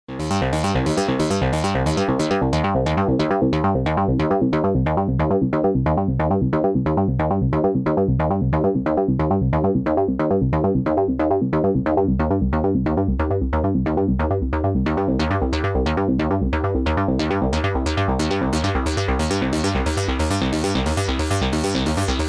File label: microfreak V4 Presets